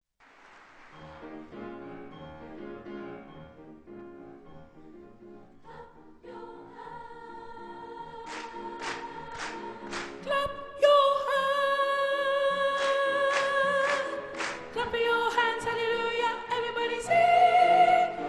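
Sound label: soprano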